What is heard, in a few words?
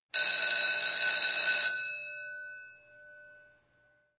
Beeps; 헐리웃특수효과음; 신호-경적